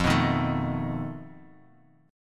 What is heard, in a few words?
F 7th Flat 5th F down; F7b5 strummed